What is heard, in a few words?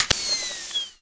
cloak off